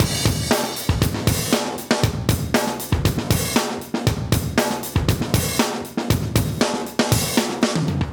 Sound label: Live Breaks, 118